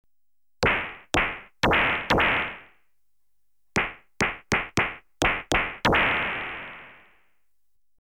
Stun Gun (Rapid Single Shots), Electronically Generated Sounds; BBC Sound Effects Library